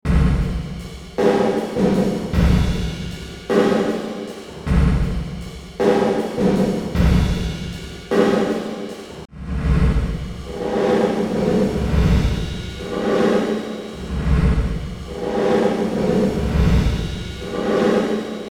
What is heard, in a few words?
MINIMAL SHAPESPREAD vs. MAXIMUM SHAPESPREAD